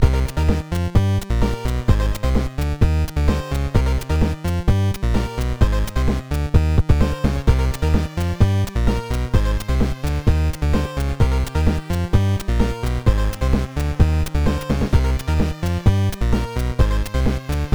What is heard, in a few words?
Train; Esper Dream 2; nes